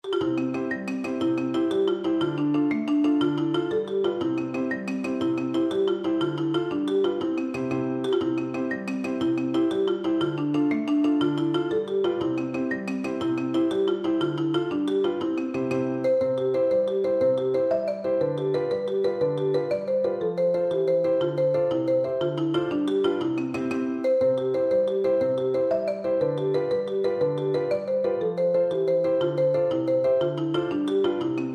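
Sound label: irish jig medley marimba, percussion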